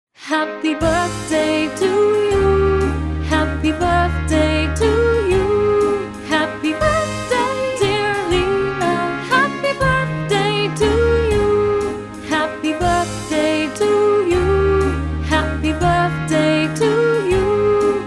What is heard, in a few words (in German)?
happy birthday lina